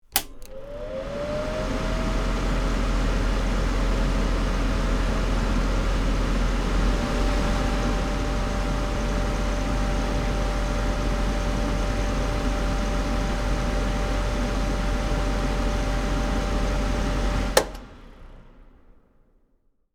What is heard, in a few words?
Extractor hood fan turning on and off; appliances